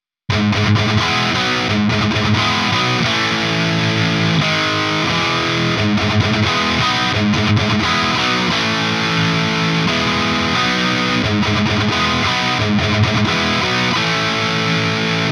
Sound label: OVERDRIVE Saturn